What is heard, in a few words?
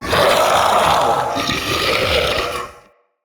Male Vocal Breath Heavy, Enemy; Mockery; Mockeries